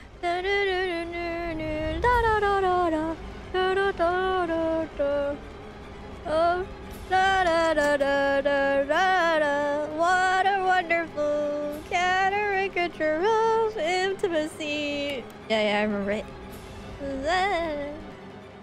Singing; IRySinging

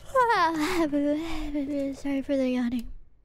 Yawn

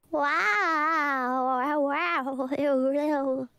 waaahhhhhh

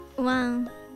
cute bark

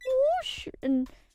woosh

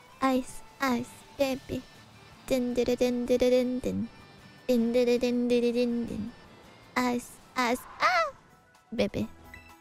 Singing; IRySinging

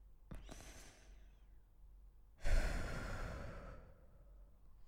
sigh

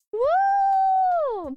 wooooooh

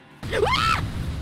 scream again; IRyScream

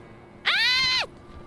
quick scream; IRyScream

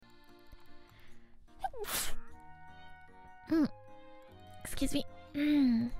Sneeze

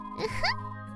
eh heh

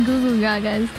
googoogaga

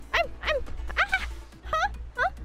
noises

